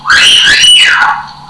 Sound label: wolf whistle